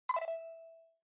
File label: Smartphone UI Sound Speaker